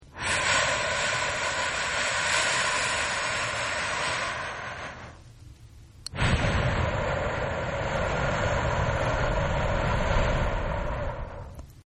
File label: Breath Very Slow Female; Breathing; Human; Partners In Rhyme